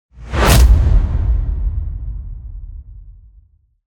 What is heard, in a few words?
impact dark epic punch; sonniss gdc